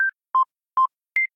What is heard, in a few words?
Input Room Numbers; LOOPERS